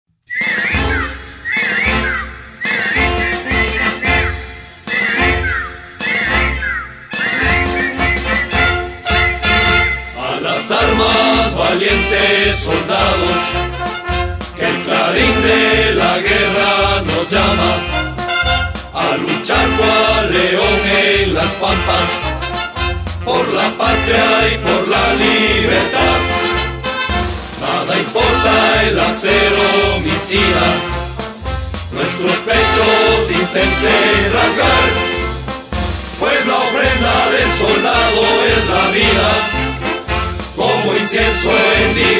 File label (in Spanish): marcha a zumba, marchas cantadas; himnos de caballeria